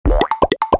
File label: STANDUP